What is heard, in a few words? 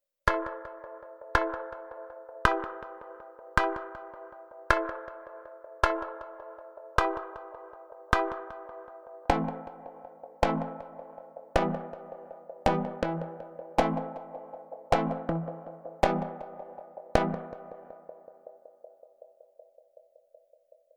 Dub Percussiv